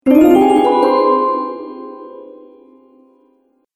Sparkle Gliss Up, magic sound effects

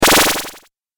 8 Bit Style Laser Pulse; Video Game

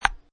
Ping Pong Table Tennis Bat Hit Ball; Sports; Lynne Music

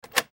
Hospital Door Unlock; Emergency; Hospitals (Sound Effects:Backgrounds)

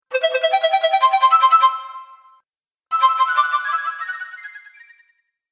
Game Award, video game sound effects

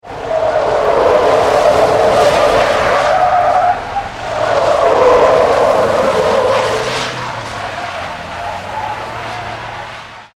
Wheel Skids, Heavy car slides with tyres skids; Vehicles; Tom Hutchings

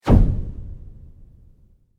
Sweep Fast; Created sweeps, swooshes and other movement effects; Sweepers; Sound Design; Foundation4

